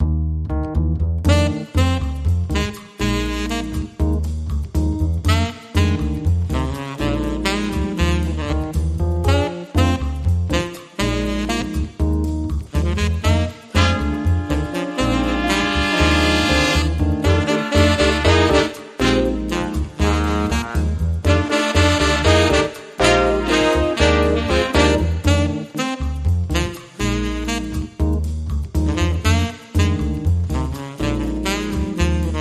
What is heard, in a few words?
Drums Underscore